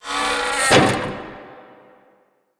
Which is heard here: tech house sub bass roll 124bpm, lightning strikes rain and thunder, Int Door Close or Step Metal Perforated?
Int Door Close